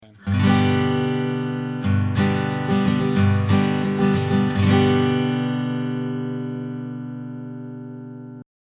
Tascam Model24 AGit Schoeps Mk4 Gain 3 Uhr EQ High -15dB